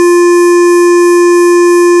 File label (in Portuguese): sinal filtrado